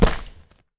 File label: Wet Plastic Slap